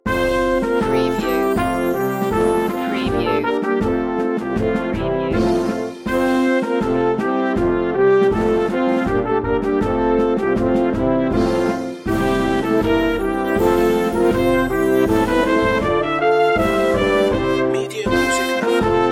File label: deck the halls brass band